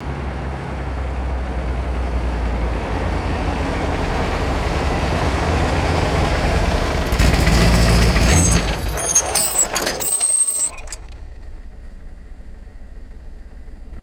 vintage heavy truck approaches stops and shuts off; vehicles